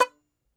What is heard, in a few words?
Tenor Banjo, Plucked Strings